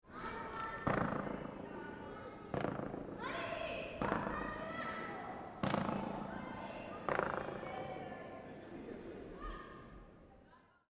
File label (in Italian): eco strada coperta; passeggiata sonora; Un modo di esplorare un territorio fondato sull'ascolto